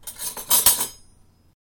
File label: Cutlery